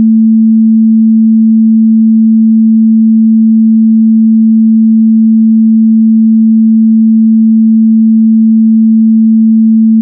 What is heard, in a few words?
beats; sounds With Dif Freq; KundtTube